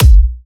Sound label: Dubstep Kicks